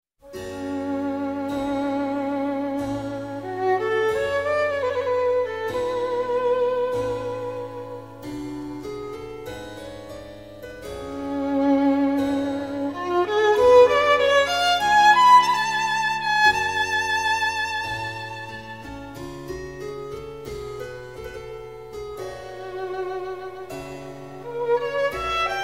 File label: Handel Violin Sonata In A Adagio; Op. 1-3, HWV 361